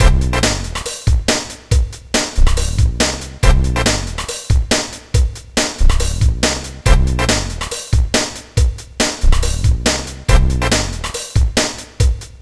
Ditty; NBA ShootOut 2001; PS2

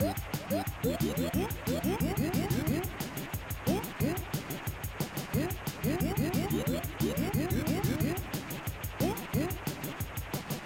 Powerup; SweatNova

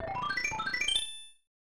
Chamber Opened; SMB3; Super Mario All-Stars; SNES

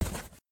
Cardboard

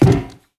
Impact Metal Dense

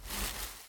Leaf

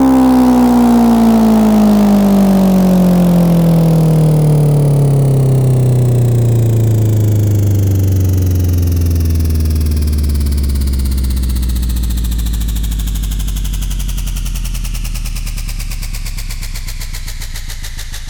Fx Downer, Bass House; Fx Downer Console

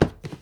Impact Small

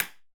Light Off

